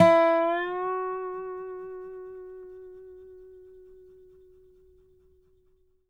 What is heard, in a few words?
DOBRO UP F#4